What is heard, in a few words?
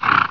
horse; wanted